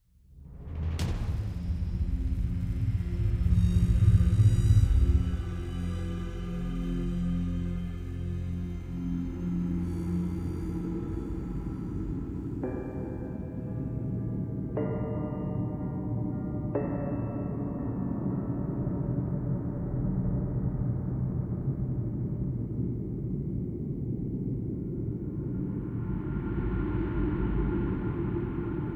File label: Dungeon; Terra Alia The Language Discovery RPG